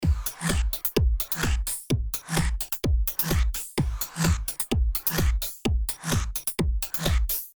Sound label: audio example w phaser